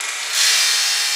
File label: FX Loop, Drums & Drones